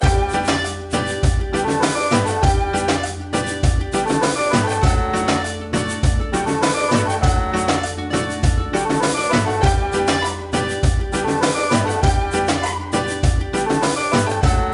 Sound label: Music Build; The Sims 3